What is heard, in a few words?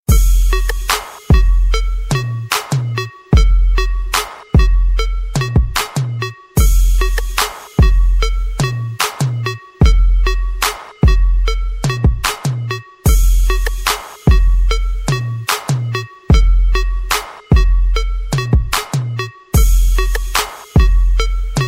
crunck bassline; hip hop